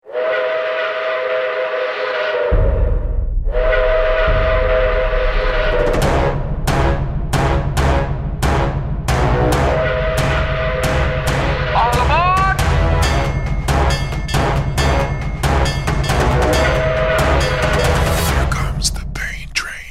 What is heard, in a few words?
Electronic; NFL Official Music; Pain Train; Cut Down